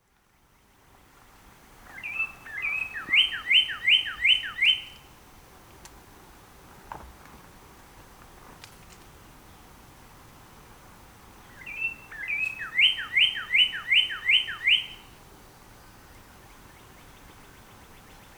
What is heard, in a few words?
songs